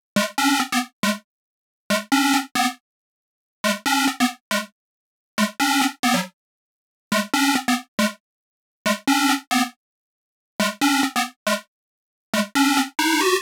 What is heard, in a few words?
Mainlead DRY 138BPM, G#minor; Melody & Synth; Vengeance Samples; Manhattan Kit